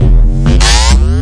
electro bonger beat, drum loops and breaks, 099 bpm; 091 to 100 bpm